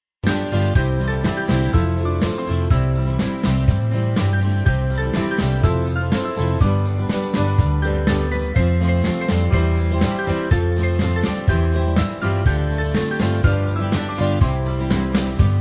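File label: Carribean Band, Free Music Backing Files for Churches; Caribbean Melody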